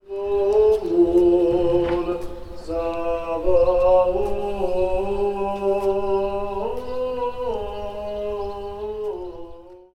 orthodox priest singing with birds in the background